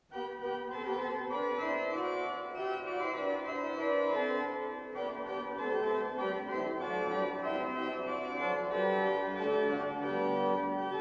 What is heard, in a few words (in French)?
improvisation musicale